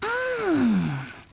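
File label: female yum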